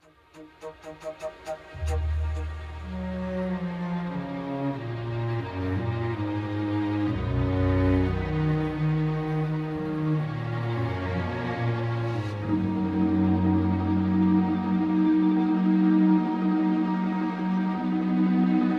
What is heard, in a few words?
Instrumental